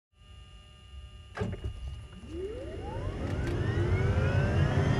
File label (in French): ebike depart